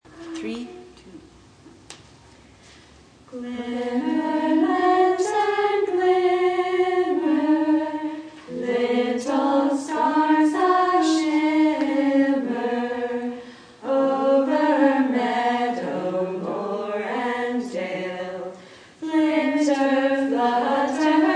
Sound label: lantern walk, glimmer lantern; Cincinnati Waldorf songbook